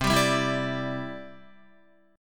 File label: C Major Add 9th, Guitar, Cadd9 chord; strummed; JGuitar